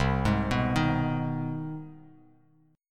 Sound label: C Minor Major 7th Sharp 5th, C; CmM7#5 arpeggiated; JGuitar